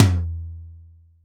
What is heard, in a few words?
ambient tom drum single hit f key, tom one shot tuned to the F note. Loudest frequency: 148Hz